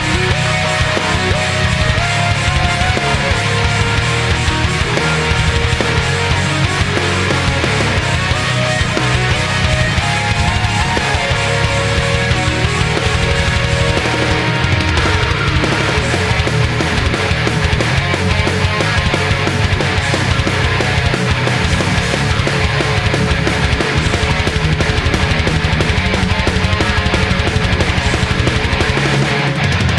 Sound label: Metal